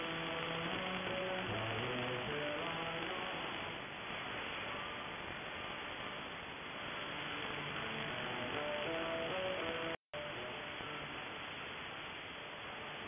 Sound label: 15215 khz; Deutsche Welle; HDLA Loop12